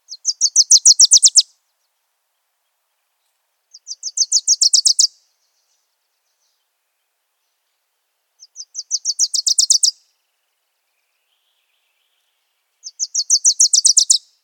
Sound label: Wilsons Warbler Song